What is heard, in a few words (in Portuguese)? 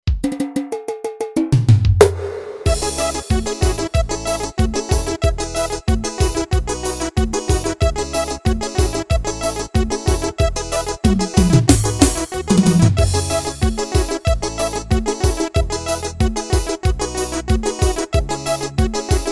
amostra forro